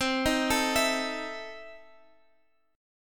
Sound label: E Major 7th Suspended 4th Sharp 5th, C